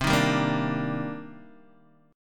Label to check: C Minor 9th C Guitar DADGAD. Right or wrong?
Right